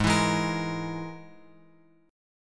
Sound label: G sharp Suspended 2nd Sharp 5th, guitar chord; G#sus2#5 Chord {4 7 6 X X 6} strummed